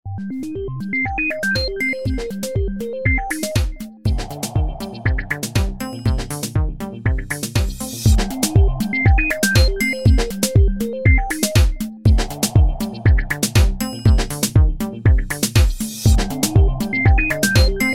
Electronic Sound; Infinix; XOS 10; ringtones

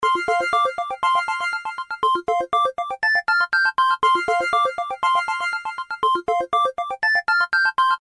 Alarm Schedule; T300 Cookie Lite; LG

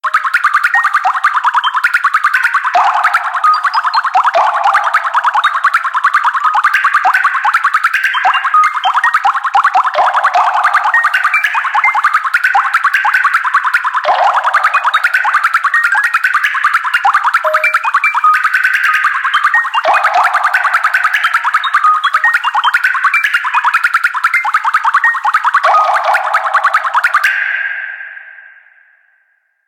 Ringtone

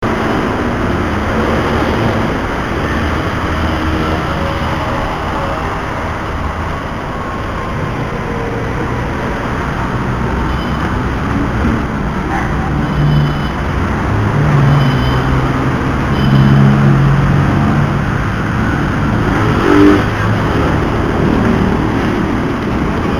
Street Walk; i-mobile 5511 3G

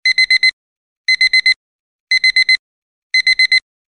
Sine Wave; Coolpad; ESurfing-No1-2021

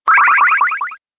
call connect

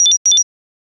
Chirp Chirp, alarms; Samsung Galaxy Tab 2 7.0

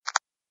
Unlock

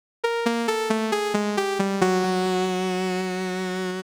80s Arcade game over; Nokia; Markus Castrén

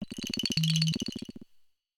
Rattle, notifications; Samsung Galaxy S25 Ultra

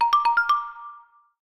Pure, notifications

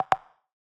Cowbell, notifications; Fairphone

